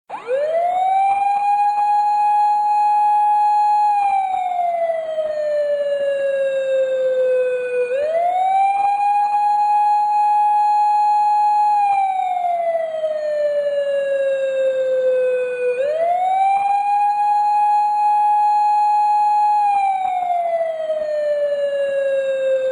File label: police